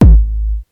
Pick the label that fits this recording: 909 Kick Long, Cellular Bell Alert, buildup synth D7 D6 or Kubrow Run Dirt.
909 Kick Long